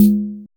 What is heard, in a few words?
SHAKER DRUM; FILM KIT; Drums In Your FACE!